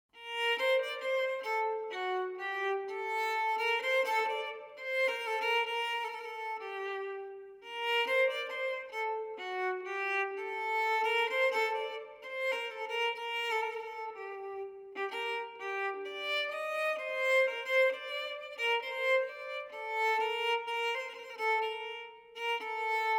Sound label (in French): baroque violon theme; airs sérieux et à boire